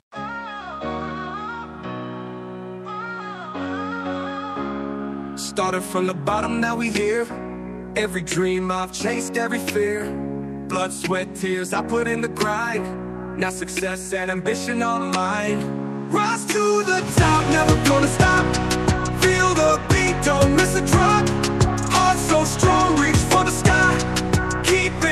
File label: hip hop vocals